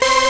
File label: synth; osu